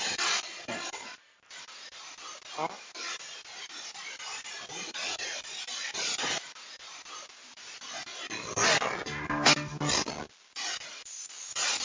2nd Floor Landing; brookline private residence investigation

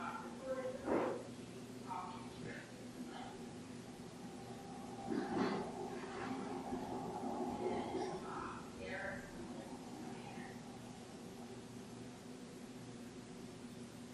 Static; Room 4

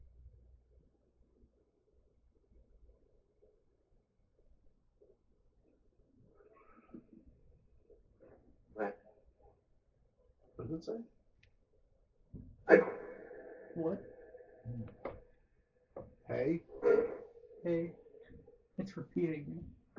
Up Couples Room Spirit Box

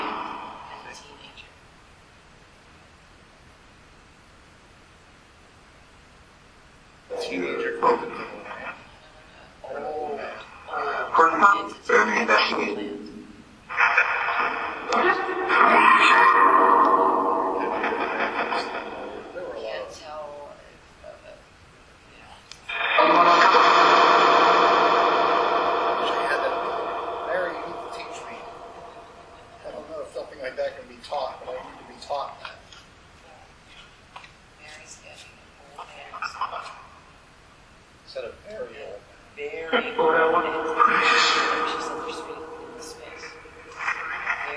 Phasma Box; beaver area high school investigation

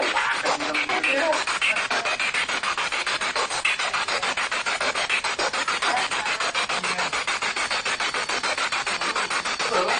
Living Room Spirit Box

